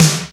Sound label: Roland R-8 Snare, Drums